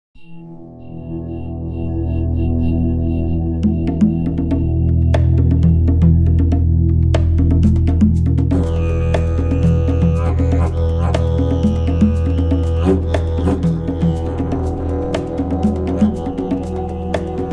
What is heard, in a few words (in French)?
la lyre